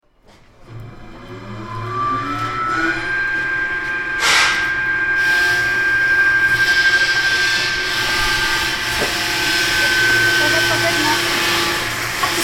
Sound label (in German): Kreissaege